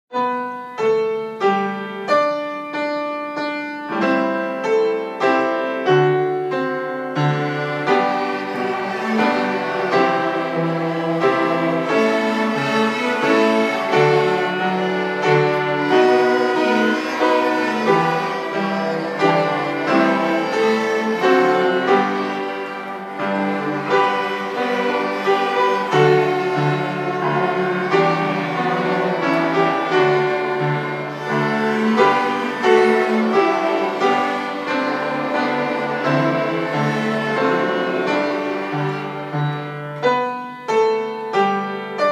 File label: orchestra strings; we wish you a merry christmas